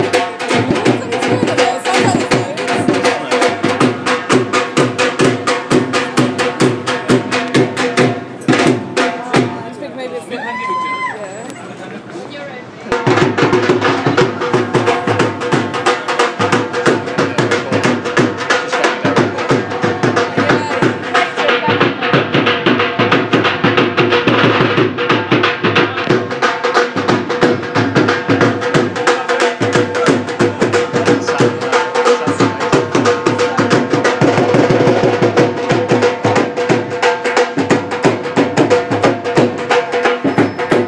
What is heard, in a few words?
Asian drumming outside theatre; her majesty's theatre